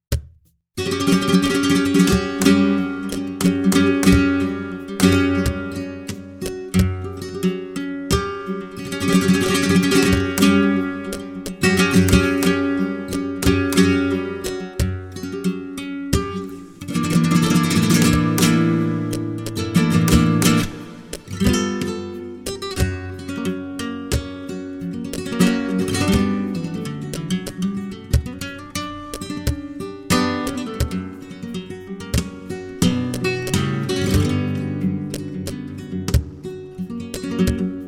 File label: Solea Guitar, flamenco